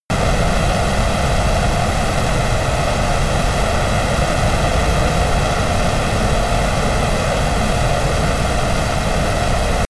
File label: military jet rafale turbine full power close